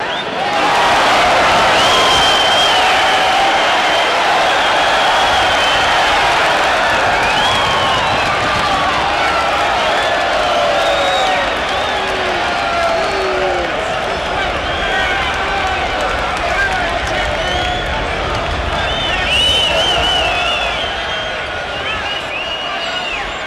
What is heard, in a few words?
Crowd Gets Excited, boxing